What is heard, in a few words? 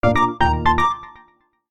String